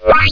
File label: shield